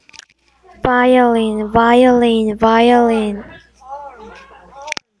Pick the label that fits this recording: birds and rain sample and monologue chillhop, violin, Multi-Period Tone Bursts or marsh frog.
violin